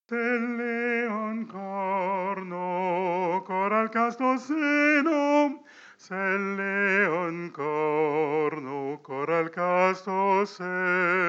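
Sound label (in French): alto voix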